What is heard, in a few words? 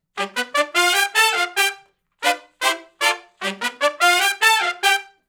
R&B Riff uni to har, Horncraft for R&B; Sections; Sonic Foundry